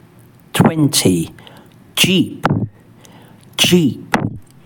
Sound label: jeep; transport သယ်ယူပို့ဆောင်ရေး